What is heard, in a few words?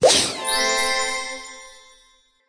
Gift Choose; Cut the Rope: Time Travel